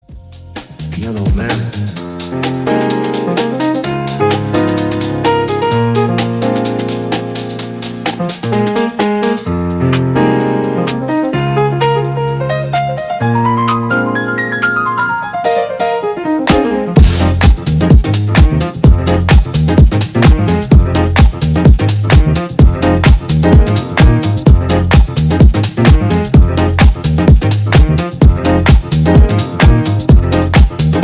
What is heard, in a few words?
PIANO